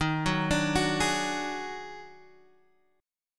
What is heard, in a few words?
D sharp Minor Major 11th D sharp